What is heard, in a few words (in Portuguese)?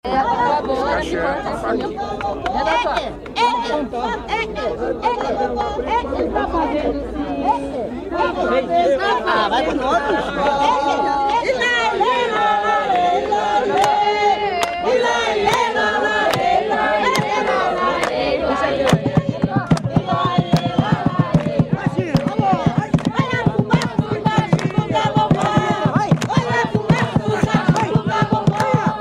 jongo; tamandare; olha a fumaca